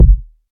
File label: boomy kick drum g key, Royality free kickdrum sample tuned to the G note. Loudest frequency: 74Hz